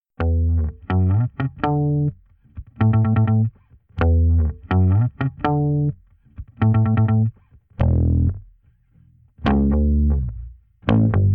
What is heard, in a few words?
rockin bass